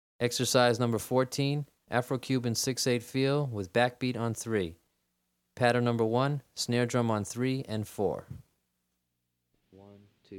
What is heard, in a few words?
Afro-Cuban 6-8 Patterns With Backbeats, Drumset